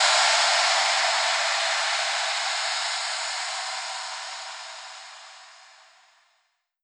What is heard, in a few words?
Crescendo Rev FX; Dance; SFX